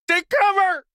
town human far; Blind Drive

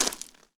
gravel; firefight

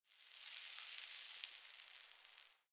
Loose Soil; Blind Quest - The Frost Demon

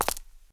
concrete; firefight

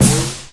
Bounce Air Orb; SFX Kit; Foley - Misc

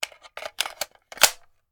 Machine Gun Foley; Sound Ideas; Guns

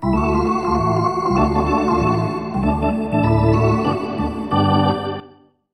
Hockey Organ

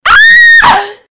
monster; blindside

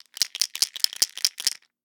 Pill Bottle Shake; Medical; Blastwave

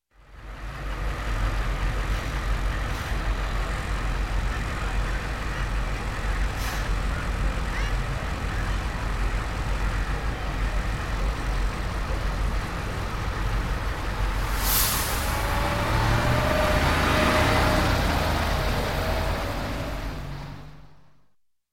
Bus, City Transit Idle, Pull Away, voices; Sound Ideas 2000 Series

